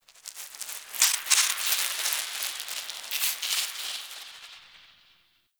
Cave Squelch Bat; Warframe; Tau

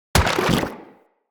Venom Growth; Warframes; Saryn

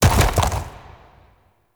gallop hooves land; Dagath; Unyielding Gallop

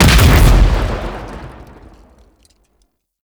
Modular Primary Barrel Explosion, Weapons; modular guns; barrels

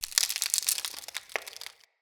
Ext Branch Rustle; Midrath Island

